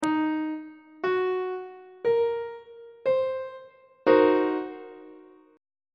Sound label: c half diminished 7th 1st inversion on treble clef